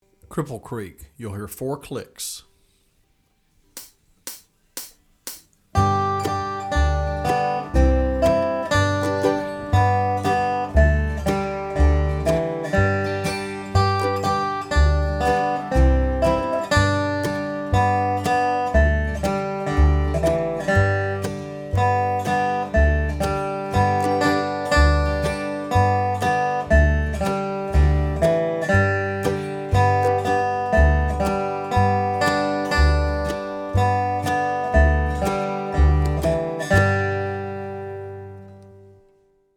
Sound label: Cripple Creek Fast